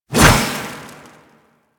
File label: Hover Whizzby Angry; Enemies; Warframe; Warframes; Pax Duviricus